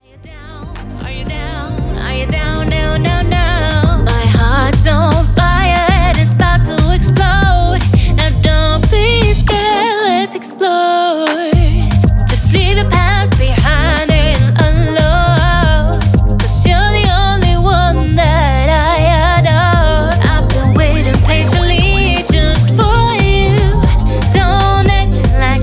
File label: House Vocal female